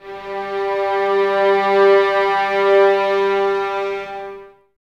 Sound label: violins G 3; Full; String Ensembles; 23 Violins; Miroslav Vitous